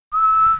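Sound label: whistle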